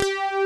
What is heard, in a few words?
POLY BASS; Pro-Rec - Synthsonic; TRINITY TR